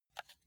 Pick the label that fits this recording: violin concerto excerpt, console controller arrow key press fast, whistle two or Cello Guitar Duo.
console controller arrow key press fast